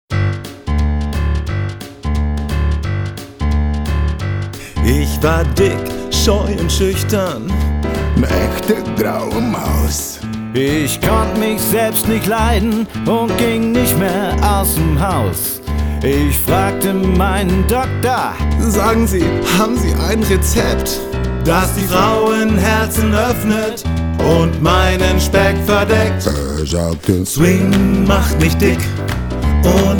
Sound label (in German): Swing macht nicht dick, Swing